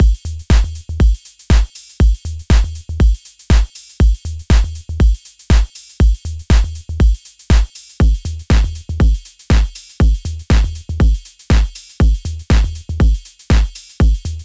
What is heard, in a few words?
Drum Buss; Ableton 10